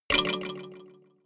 notification kalimba delay major

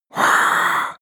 male breathe eerie

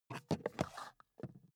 charger pick up

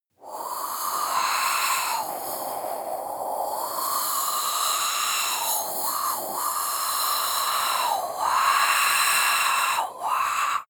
breath female eerie wind wah

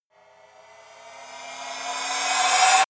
reverse hit metallic

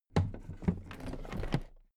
toolbox raise heavy

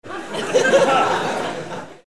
laugh

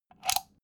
socket pull plug

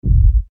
heartbeat

